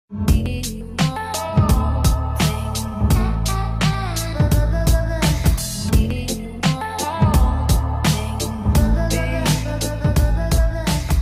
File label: podcast test music